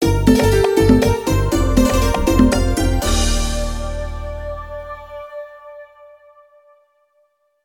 arabian

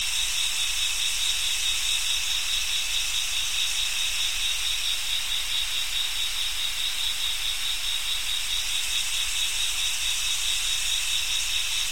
summer incect

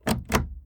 akanai door

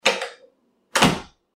door close

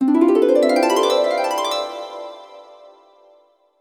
harp tararan

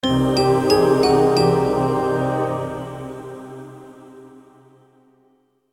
sound logo

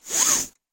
seatbelt

